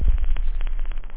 faint, drums bass; da capo vol1

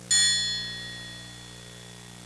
triangle hit; Sound Effects for Multimedia - Volume 1

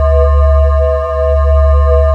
targeting laser; Earth and Beyond; EA Games

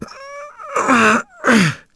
strain; shadman

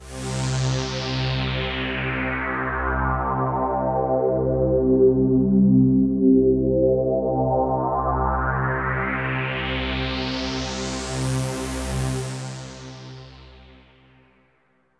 synth pad ambient 160 C; Sphere

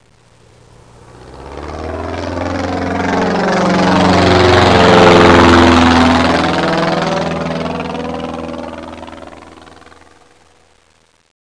Single Prop Cessna; Aviation; 8-bit

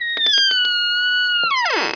door; OUTLAWS

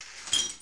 amb lounge misc

